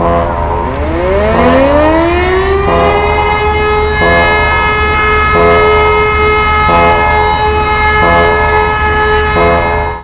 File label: sirena; cstrike; basebuilder